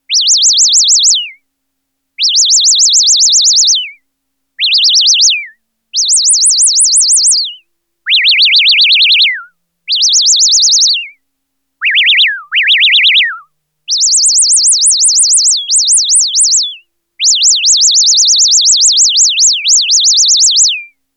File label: CHIRPING, Roland SH-101; Factory Patches